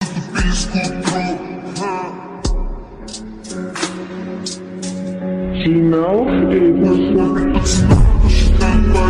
Attitude Ringtone; Sigma Male

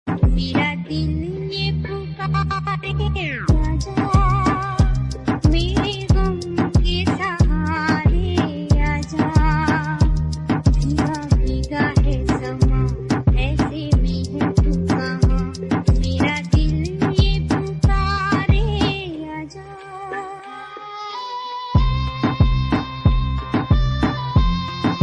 Dj Remix Hindi Ringtone

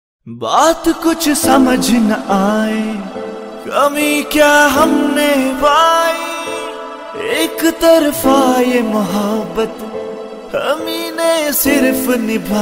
Old Hindi Song Ringtone

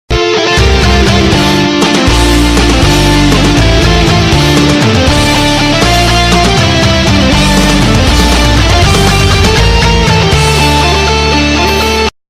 Heavy Metal Bgm Ringtone; ringtones

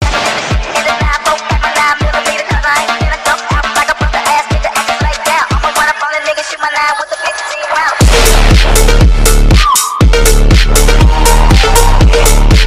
Music Attitude Ringtone; ringtones

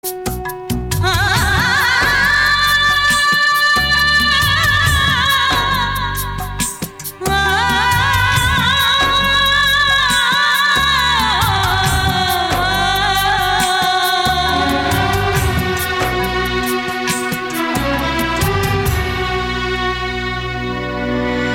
Sad Music Ringtone

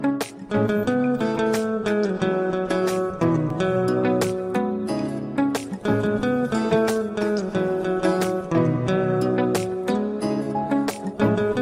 Instrumental Ringtone

